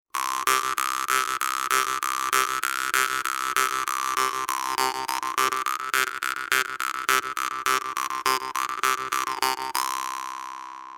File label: Off Bbeat